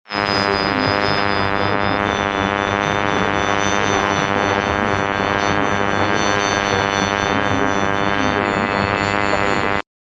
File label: raw sounds